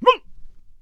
Bard Attack Vocals

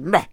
Bard Attack Vocals

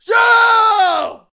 Boxer Attack Vocals

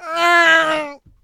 Tempest Lich Attack Vocals

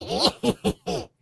Banshee Attack Vocals

